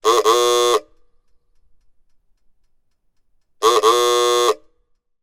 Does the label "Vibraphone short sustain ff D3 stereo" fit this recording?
No